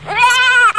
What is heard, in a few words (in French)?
Chevreau